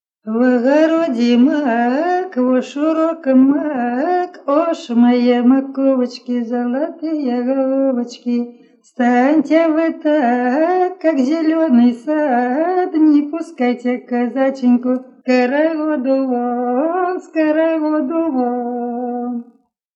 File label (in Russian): колядная хороводная; В огороде мак